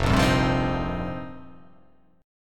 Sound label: F 7th Suspended 2nd Suspended 4th